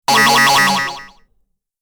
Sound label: echo robot